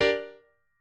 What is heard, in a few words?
piano